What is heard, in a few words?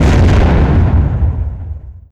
nuke; SuperPower 2 Redux V7A